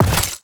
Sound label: metal small equip, inventory